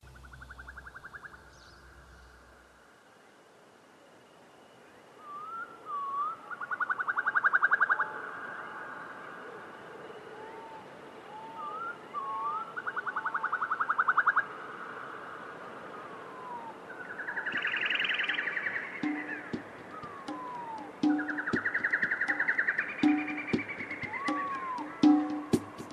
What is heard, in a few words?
ambient with nature